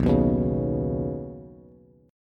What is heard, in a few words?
B Major Add 9th B Bass; Badd9; strummed; JGuitar